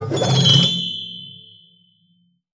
GLISS, SPINET; Xsample VOL-7

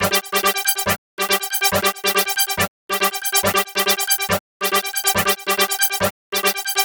Arp 140bpm G, Trance; Zero-G Trance Inducer; Arps 140bpm; 90 s Sample CDs

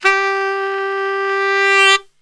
G 1 SAX, Brass Super Section; Best Service

